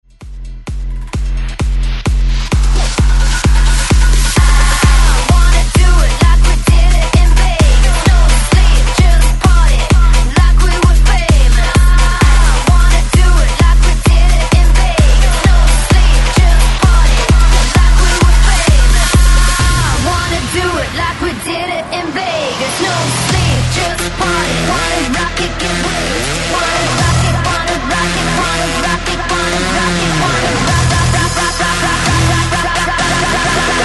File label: 130 BREAKMIX MASHUP